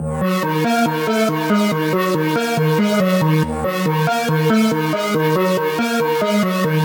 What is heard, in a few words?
Riddim Melodic Loop - D# 140; Spy; Ghosthack; Riddim Essentials Volume 2